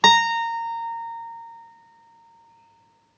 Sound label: string 1 fret 1